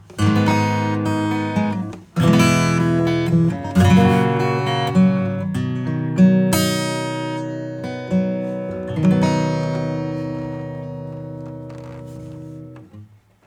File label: acoustic Pop